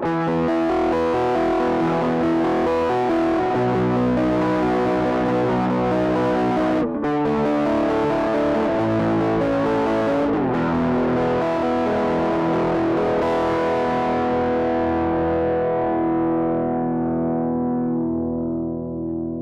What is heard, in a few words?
EMG81X (neck) - Ibanez Nu TS-9